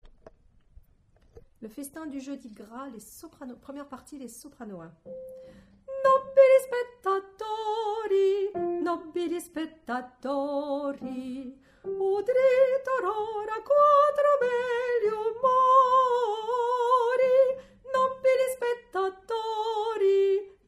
Soprano

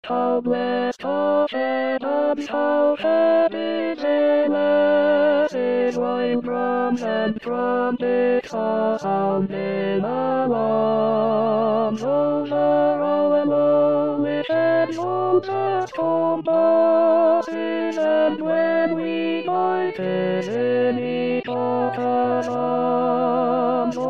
Tenor

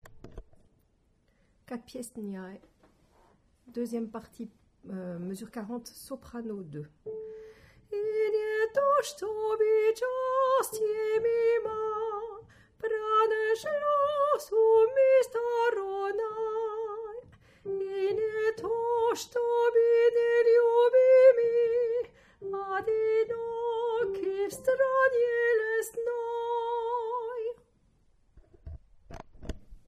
Soprano

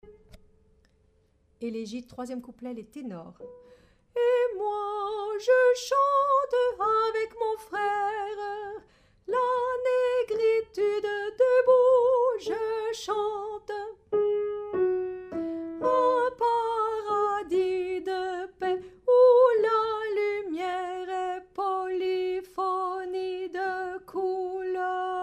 Tenor

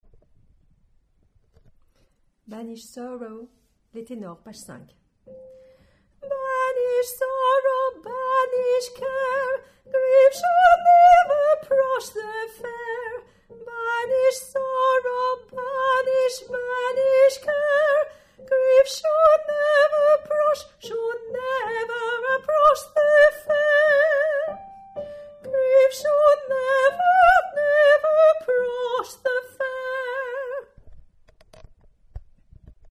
Tenor

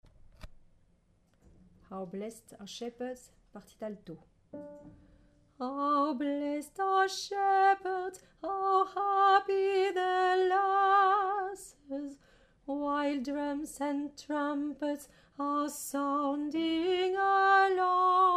Alto

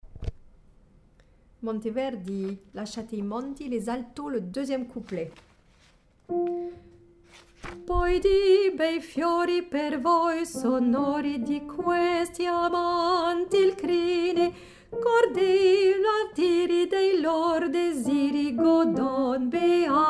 Alto

